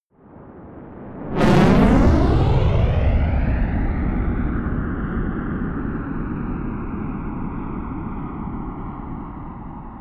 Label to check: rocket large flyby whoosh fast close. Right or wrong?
Right